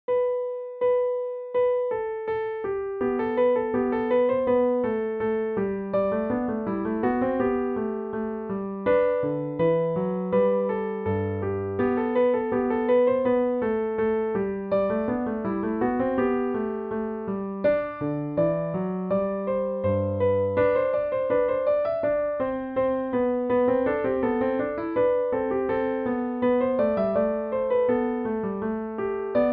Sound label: piano canon